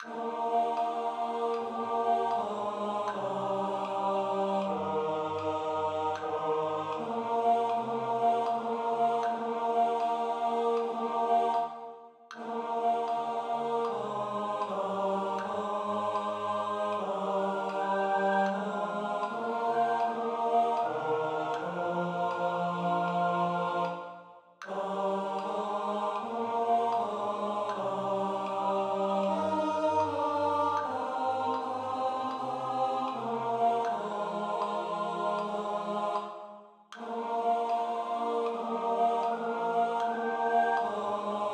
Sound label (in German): Bleib bei uns Tenor; Kantorei